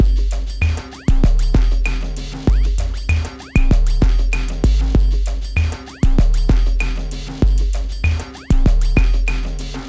Beat, Drums & Percussion; Electrocution; Beats

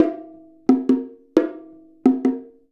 Bongo; Essential Percussion

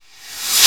NOISE CMBREV; AKAI S-Series CD-ROM Sound Library VOL-1

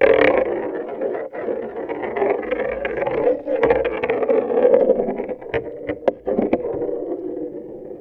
In The Bath, Marbles, contact mic; musicradar; contact mic madness; Raw

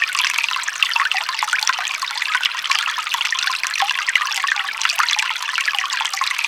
BROOK, RIVERS; Zero-G Ambient 1

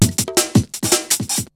BREAKBEATS, TECHNO; Syntec; Wall of Sounds; TECHNO-BOX

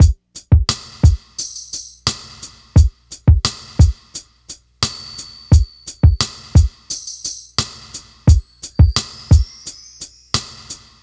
drum, Hip Hop and R&B Drumloops; Best Service ProSamples vol.01; AKAI

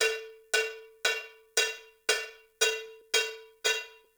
Disco Bell, 70s Analog Funk; Sonic Foundry (Sony Creative Software); What it Is! 70s Analog Funk

